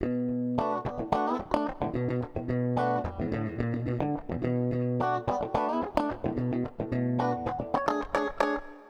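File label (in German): funk, Gitarrensounds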